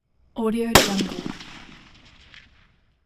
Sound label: m4 carbine rifle single shot